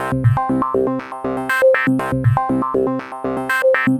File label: Synth